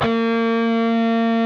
PRS lead, guitars; Spectrasonics; Hans Zimmer Guitars VOL-1

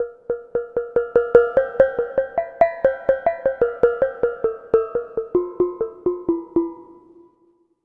Pelog Ketuk Melody; Melodic; Ambient-Melodics-II

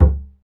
acoustic bass fx; Spectrasonics; Bass Legends

